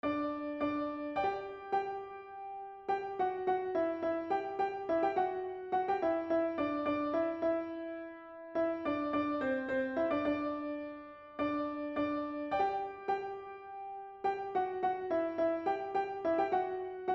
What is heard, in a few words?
piano